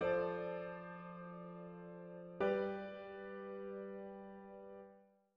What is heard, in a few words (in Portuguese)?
Estudo de Harmonia acorde de subdominante com sexta acrescentada resolução na dominante